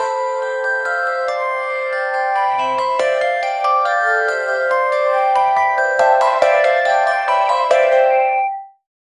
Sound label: online slots farm reels, slot machine